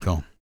DELTA, BLUES

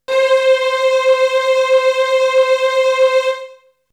Melody oneshot; ZERO G - Datafile DISC 2

